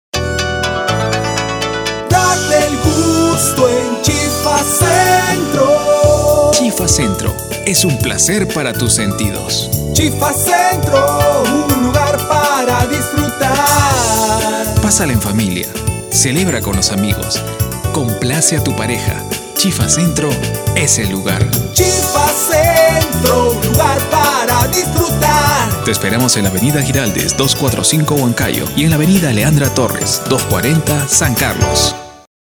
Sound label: JINGLE